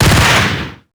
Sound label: shoot ingram; weapons; Max Payne